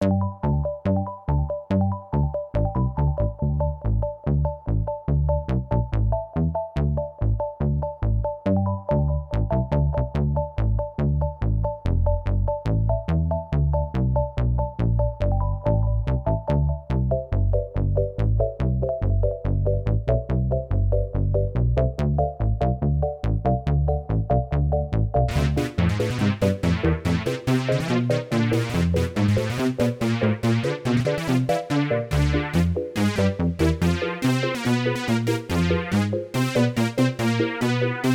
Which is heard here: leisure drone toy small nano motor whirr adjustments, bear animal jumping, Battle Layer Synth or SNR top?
Battle Layer Synth